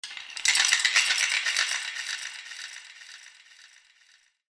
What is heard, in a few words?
wood; Crysis Warhead